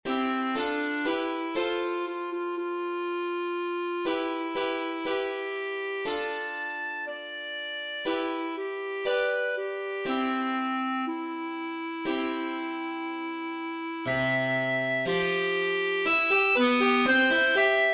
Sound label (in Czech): klarinet a cembalo